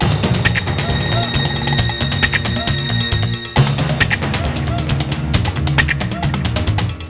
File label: loop; Creative Review CD-ROM 28; kungfu